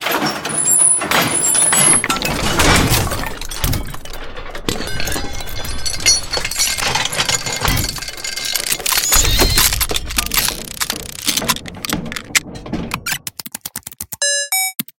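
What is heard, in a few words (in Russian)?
сильный грохот от поиска нужной вещи в захламленном сарае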